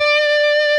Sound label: solo Left-hand vibrato